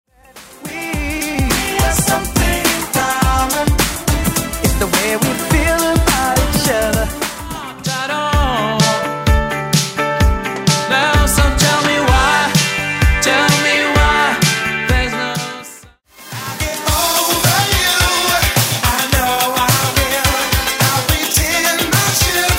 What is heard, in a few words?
Classic Extenden Redrum